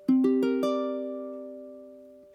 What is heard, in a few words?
chord c d g ais, ukulele